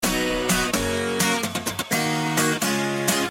Guitar Sms

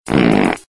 Fart

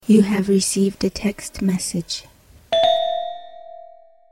Received A Text

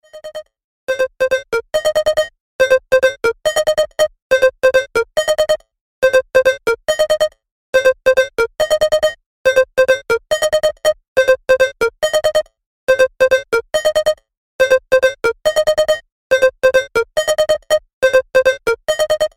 Bleeping

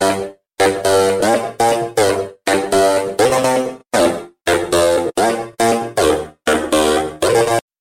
Synth Loop

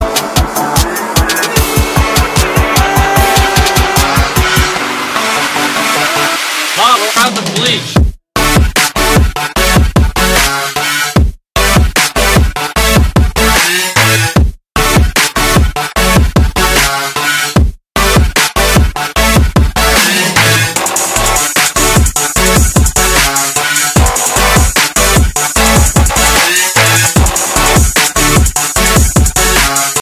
Trap Remix; MrBeast

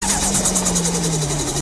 awesome turbo sound

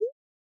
Incoming Msg

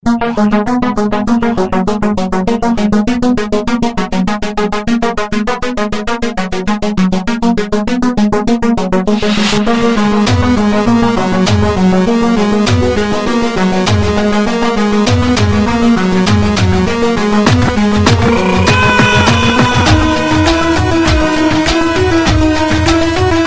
Brake Mix

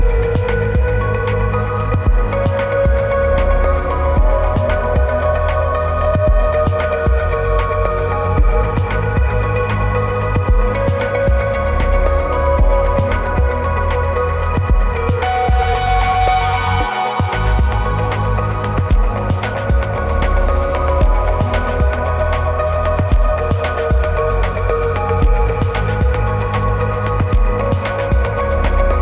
Light Trance

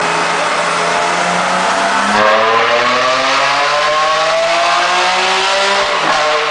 Ferrari Sound; Enzo

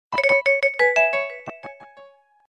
Pleasant Sms

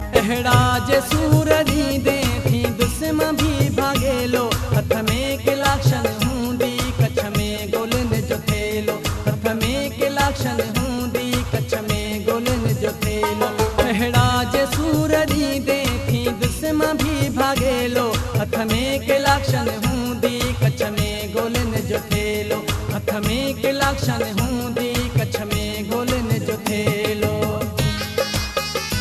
Sindhi

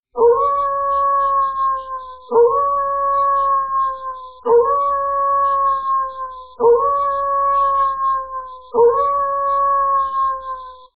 WOLF HOWL SOUND

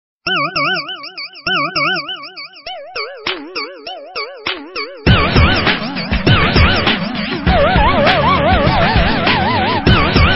Skull Remix Of Nokia

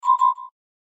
Double Twist Pop Up

